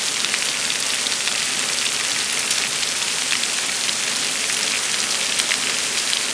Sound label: rain loop